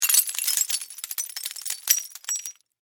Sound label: Impact Glass Debris; Materials